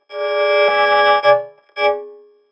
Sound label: violin